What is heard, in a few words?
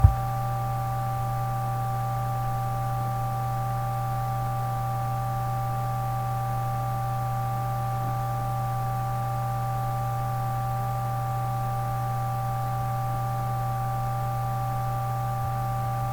ThinkPad L420 Fan Noise